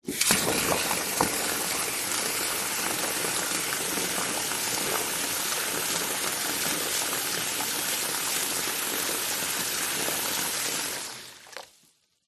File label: household water from tap into full plastic bucket